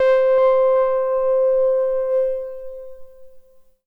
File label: Prophet REV2 keys echo high - C; One Shots; Cymatics; Fantasy Synth Sample Pack